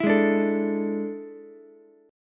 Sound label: E 11th, Ukulele, C6; strummed; E11 chord; JGuitar